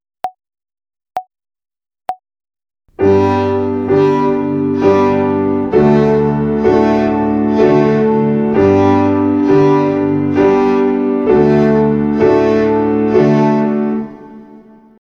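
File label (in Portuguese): Ginástica para o dedo 1 - Corda SOL - Ternária, Violino; Violino para os Pequeninos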